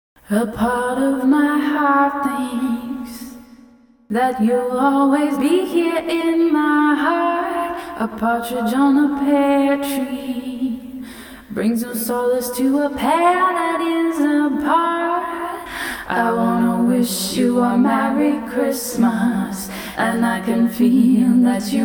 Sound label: If You're Nice (Acapella); merry gear solid 2 the ghost of christmas past pc